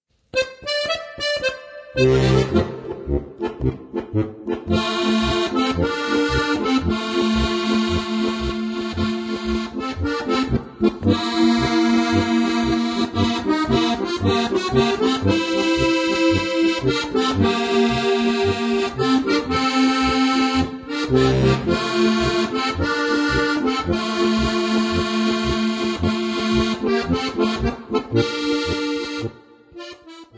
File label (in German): Fuchsgraben Polka